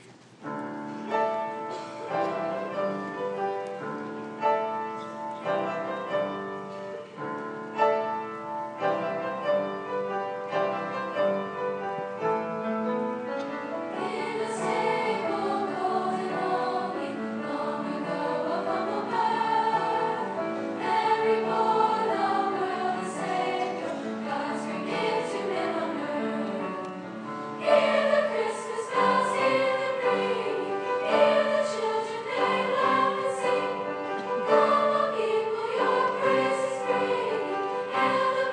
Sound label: christmas chorus